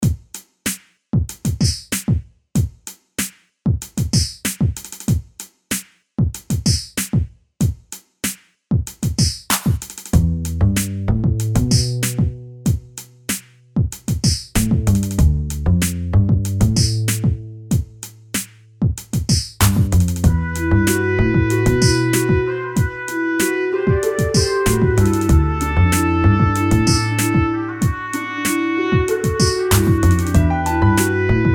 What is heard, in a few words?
trumpet; weeklybeats-2014